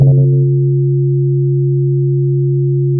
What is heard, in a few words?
pop, Sound of a church bell